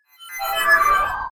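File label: space evaporation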